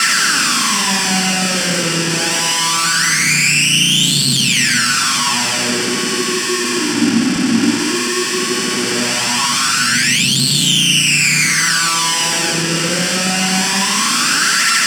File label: scrape moog; Spectrasonic Distorted Reality 1